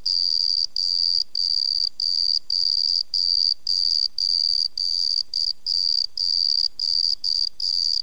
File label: gtexensis, crickets